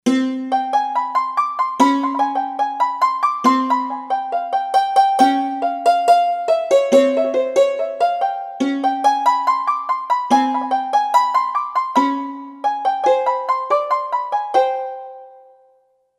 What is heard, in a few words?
Santur, Roland SC-880; Factory Tones